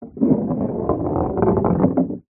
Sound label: sport pool ball roll inside table after being potted